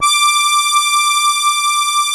ZUPAN ZUG D3, AKKORDEON; Best Service; Alpine Volksmusik

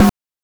BRK TOM; NES DPCM; MIDINES

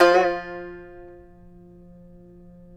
tar; Roland - LA Composer vol. 3

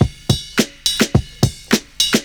CYMB, Breakbeats

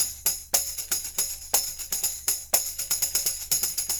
120 tam; Percussion

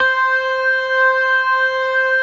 CLASSIC C3, Synth and Organ

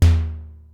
Simmons SDS-9, Drum Machines

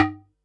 Perc, Percussion and FX; Pearl SC-40

